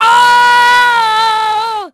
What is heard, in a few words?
falls; VOICE; CARENA; Pulse Racer